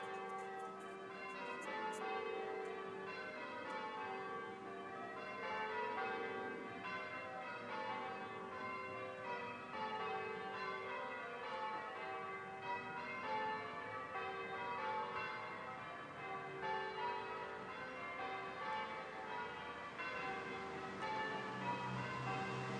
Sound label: church bell ringing practice hampton in arden